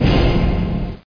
orch

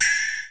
exlamation

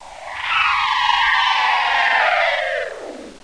wraith; duke nukem total meltdown

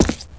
step, footstep, pave; SINDEMO

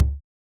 kick; Mistergenerator

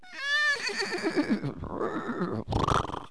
horse; animals; Wired for Sound Pro CD; Aristosoft, Inc.

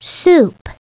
soup

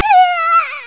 Trash; fight

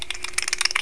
Spider Approach; Curse of Dragor

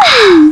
Disp Dormant; Crystal Crazy Demo; Casady & Greene

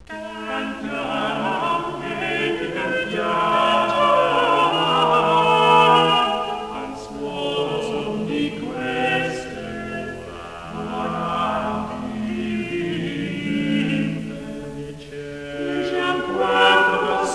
harmony

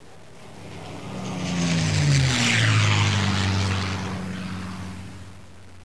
airplane

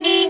honk; BESTSHOT; noisybit

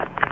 Siren; nfsdemo

